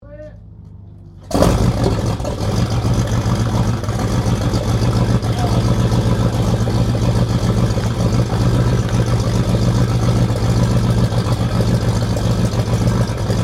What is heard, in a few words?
AIRCRAFT 1916 SOPWITH PUP REPLICA STARTUP IDLE STEREO; Replica of a 1916 Sopwith Pup; Airplanes